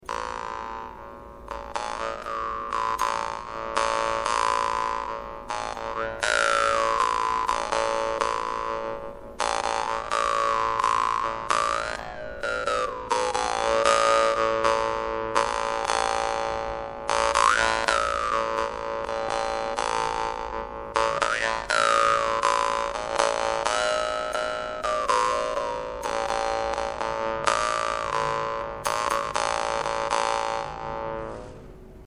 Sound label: dan moi; dan moi moneta; vargan